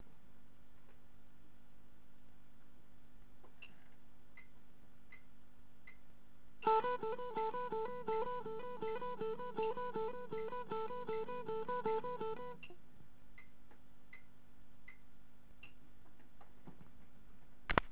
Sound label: alternate picking